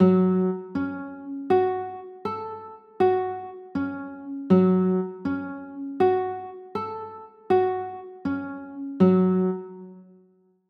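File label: G flat m9 chord, guitar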